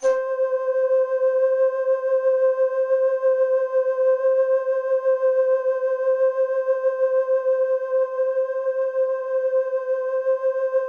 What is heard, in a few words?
C4, Synth Line; 90 s Sample CDs; NiceBeats; SynthLine VOL-32